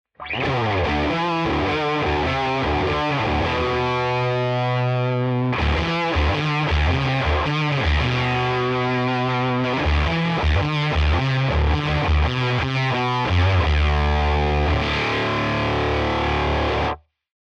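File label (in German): LifePedal Asymm 12 Uhr Octave low to high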